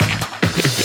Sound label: Fills, Loops 140 BPM